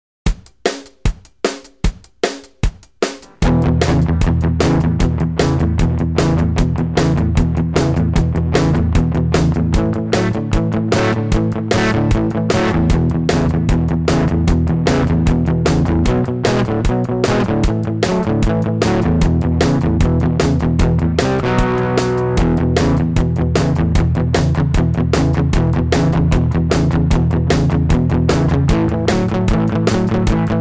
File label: Rhythm Guitar